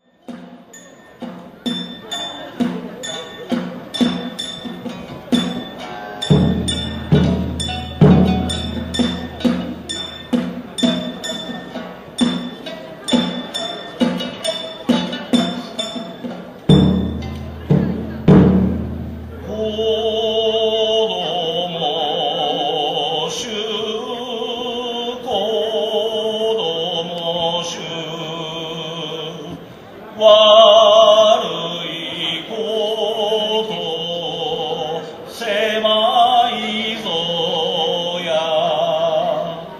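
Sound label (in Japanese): 人形囃子, からくり人形演技の際に演奏される; からくり人形(傀儡師)演技の際に演奏される。再び傀儡師、山猫飛ばし。; 亀崎; 人形囃子4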